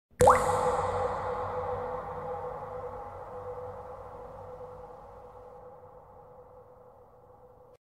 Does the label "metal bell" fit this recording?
No